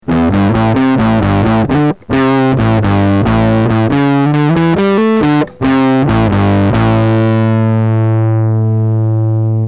Electra Distortion, weird octave down effect, guitar; guitar - effect - sound card; Recorded by A. Colman